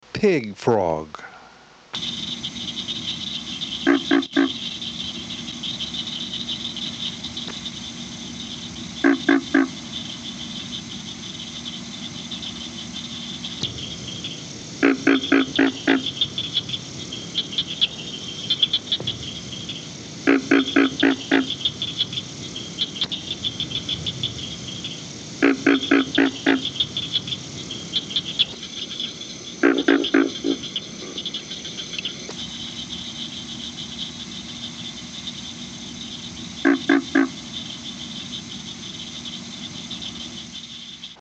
Pig frog (Rana grylio); Family Ranidae - True Frogs

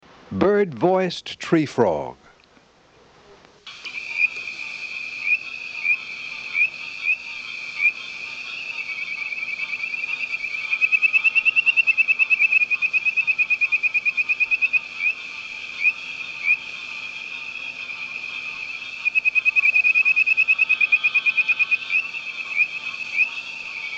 Bird-voiced treefrog (Hyla avivoca); Family Hylidae - Treefrogs

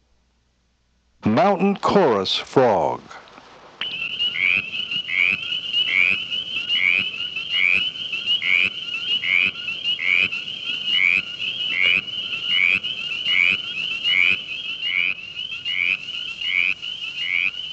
Mountain chorus frog (Pseudacris brachyphona); Family Hylidae - Treefrogs

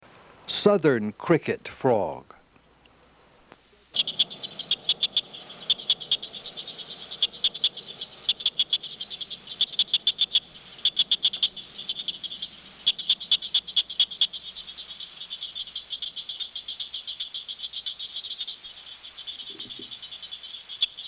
Southern cricket frog (Acris gryllus); Family Hylidae - Treefrogs

